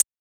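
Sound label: 808 Amiga Maracas, percussion; Polyend; Legowelt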